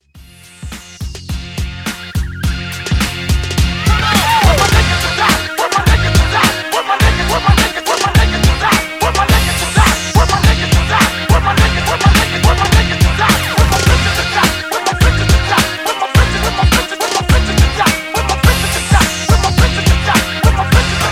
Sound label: Old School Party Break Intro 105